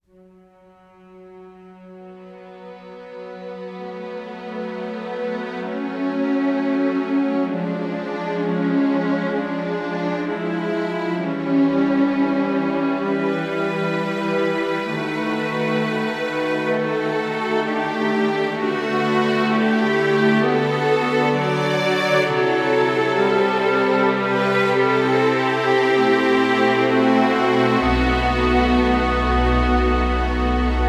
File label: Game Over